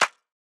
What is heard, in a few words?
Clap, Drum One Shots; Claps; Cymatics; Eternity Sample Pack